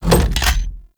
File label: Weapon Pickup Heavy Mech; Warframe; Archwing